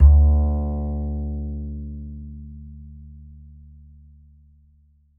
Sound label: A, Upright Acoustic Bass; Larry Seyer; SWITCHABLE POSITIONS